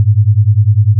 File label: sine 100 110